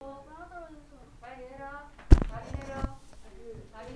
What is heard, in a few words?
cry